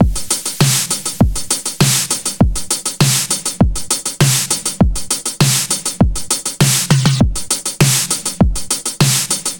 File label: 100 Beat; Zero-G - Future Wave